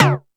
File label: Toms, Drum Machines E-MU Pro-Cussion